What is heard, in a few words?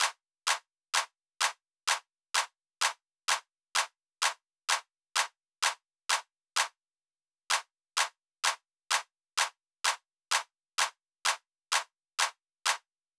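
Drum Loop hi-hat Clap, 128BPM; VEC5 Drumloops; VEC5 Multi Loops 128BPM; Loop Elements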